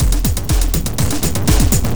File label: Processed Beat, Drums & Percussion